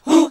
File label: HAL GOSP. MIXED; Sound & Vision - Gigapack II CD 1 (Roland)